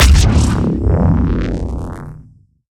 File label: charge hit hard